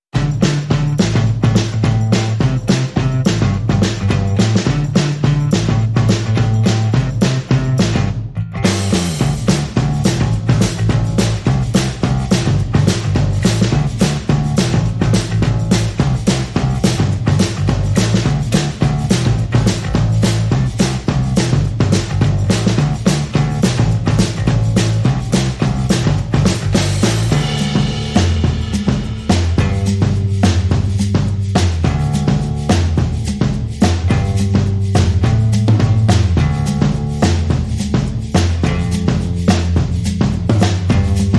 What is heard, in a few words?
Drums and Bass Only